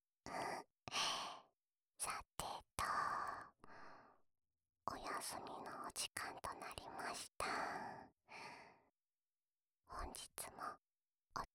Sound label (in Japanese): 幸せな吐息ループ（左耳）